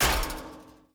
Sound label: Footstep Metal-Fence